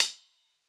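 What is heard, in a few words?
closed hi-hat; eddyflux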